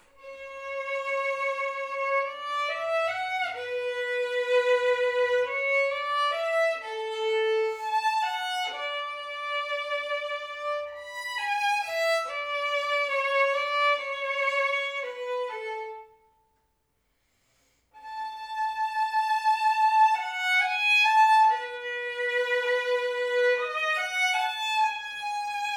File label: solo bach arioso, Violine solo